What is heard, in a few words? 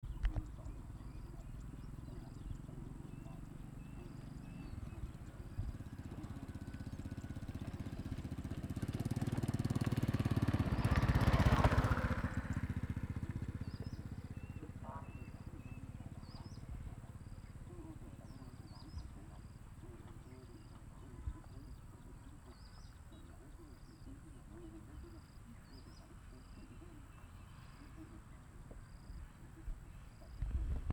village motor ambience; 2019